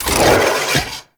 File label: Spear Throw Charge, Weapons; Warframe; Tenno; Priest Spear